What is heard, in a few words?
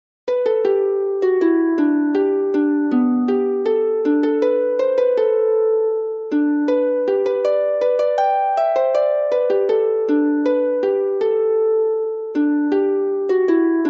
Welsh Harper; Welsh-Style Tunes